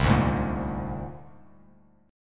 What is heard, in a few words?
C 7th Suspended 2nd Sharp 5th C down Bass Standard; C7sus2#5 strummed; JGuitar